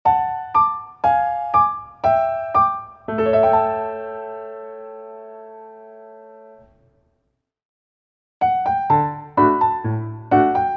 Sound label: piano